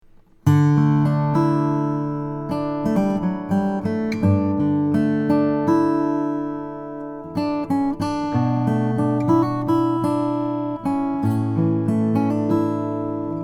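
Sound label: froggy bottom r deluxe amber sunburst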